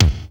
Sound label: BD, DR BD; Best Service Gigapack 2